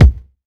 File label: nice bass drum b key